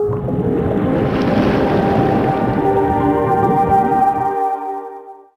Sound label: Round; Zenses Ocean; nds gamerip